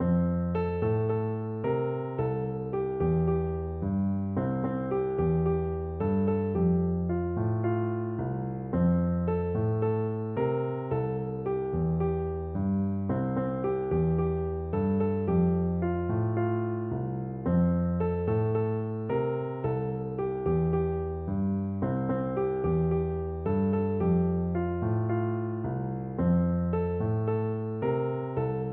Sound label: hush little baby, piano